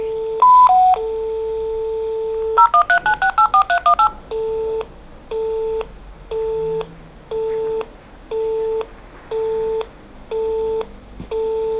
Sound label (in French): sonnerie